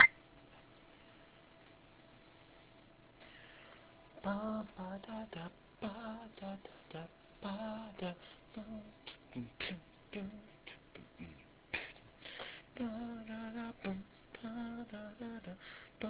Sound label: Voice Only Very Pop